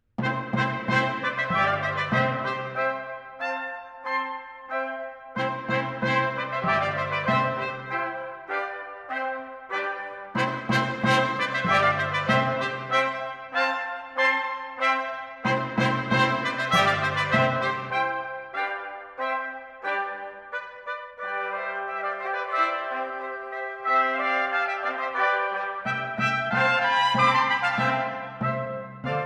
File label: Divertimento in C major, for 5 trumpets & 4 timpani - Gavotte; Mozart; Serenades-Divertimenti-Dances